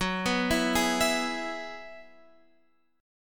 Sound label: E Major F sharp; arpeggiated